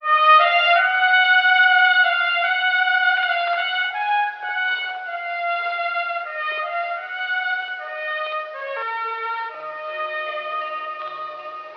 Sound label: horns, Sad